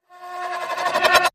Strange Shadow Flutter Sweep

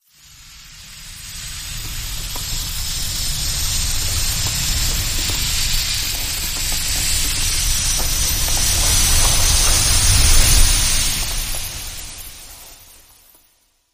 Rise

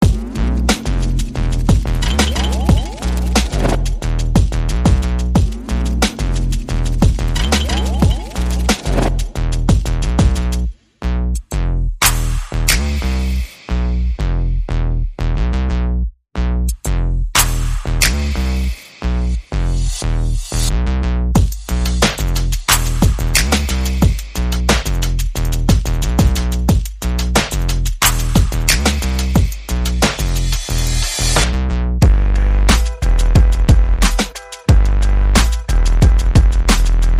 No Vocals

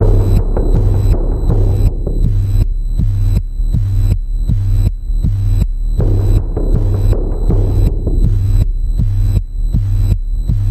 Panning Pulse G, Key of G

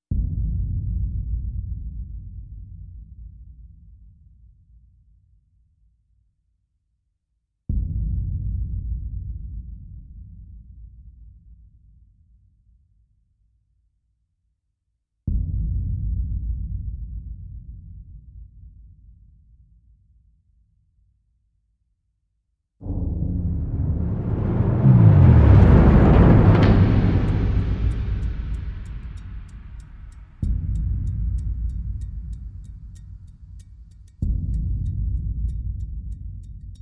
Percussion Only

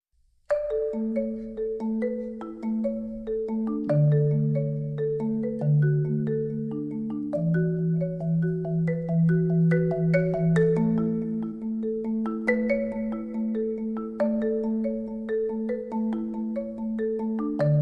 Harefoot Marimba, Solo

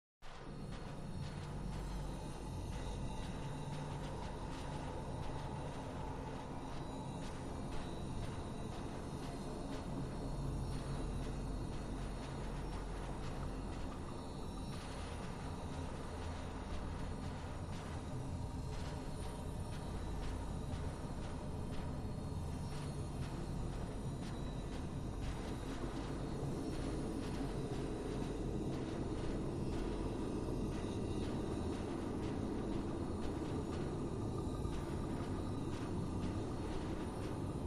Drones

